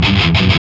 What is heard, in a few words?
GTR; Roland - LA Composer vol. 2